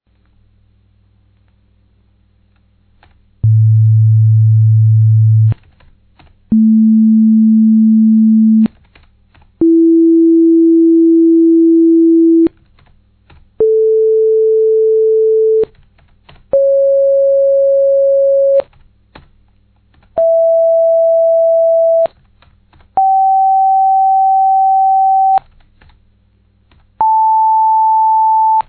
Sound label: harmonic scale